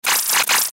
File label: Flash Animation Multimedia; Web Interactive; Technology; Buttons; Blastwave